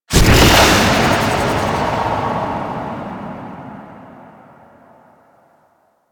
Push Ability; Warframe; Alad V; Abilities

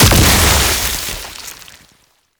Infested Spore Pistol Explosion; Weapons

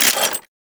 Grenade Launcher Mech; Warframe; Weapons; ThanoTech

